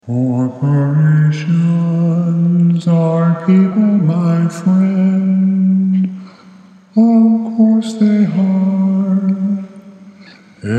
melody with text

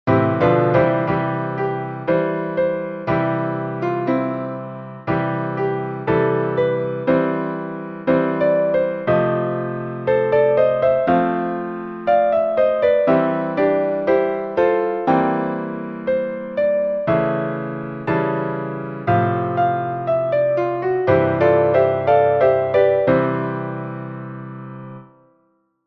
sharon blues

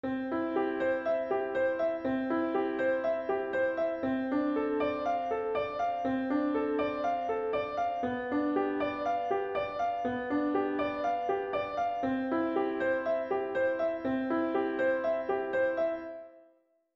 prelude; bach; WTC

